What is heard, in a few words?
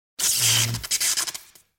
Звуки Электричества The Sound Of Electricity